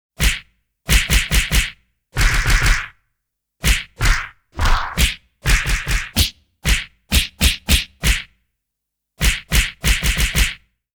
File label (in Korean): 효과음 때리는소리; StreetFighterSalsa